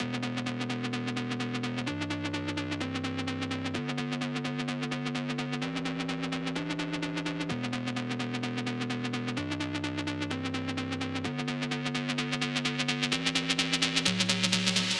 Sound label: 128 BPM E Min Chords; House; Loop Stems; Cymatics; Breakdown Loops; Goliath